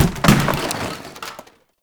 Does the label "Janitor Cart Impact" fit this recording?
Yes